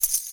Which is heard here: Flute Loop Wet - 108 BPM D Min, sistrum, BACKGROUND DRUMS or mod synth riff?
sistrum